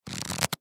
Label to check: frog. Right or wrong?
Wrong